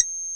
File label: tone little hi